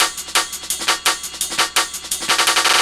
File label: Reel Funk Roller; Jungle; Breaks; Blu Mar Ten - Jungle Jungle - 1989 to 1999 Samplepack